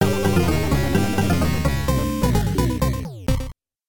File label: battle draw